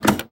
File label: Electronic Buttons Arcade Game Buttons Single